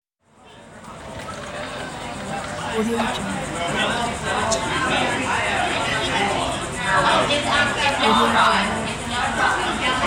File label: outdoor cafe crowd